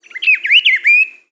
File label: bird, ambient animal; Counter Strike Model Pack; CS:GO